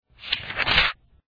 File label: page Turn